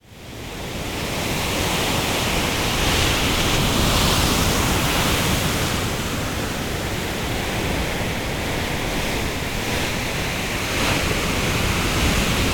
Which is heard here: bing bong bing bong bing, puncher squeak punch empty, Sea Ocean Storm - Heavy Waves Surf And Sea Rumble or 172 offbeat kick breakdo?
Sea Ocean Storm - Heavy Waves Surf And Sea Rumble